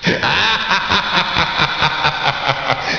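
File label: laugh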